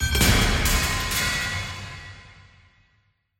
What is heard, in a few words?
panels clatter; scripted sequences; eli rescue